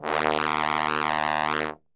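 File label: D#1 bass trombone, Brass; Best Service; Brass Super Section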